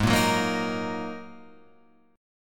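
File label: A flat 7th Suspended 2nd A flat Guitar Open F, chord; Ab7sus2; 8,5,6,5,6,5; strummed; chordshape; JGuitar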